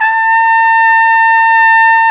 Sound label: Solo Brass, Tpt p, RCA